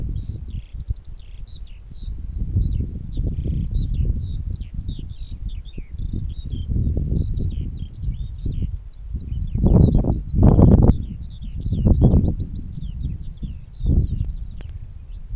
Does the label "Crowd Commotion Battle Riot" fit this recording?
No